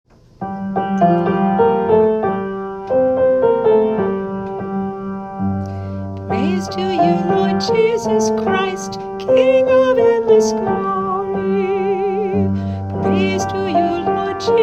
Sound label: Gospel Acclamation; Palm Sunday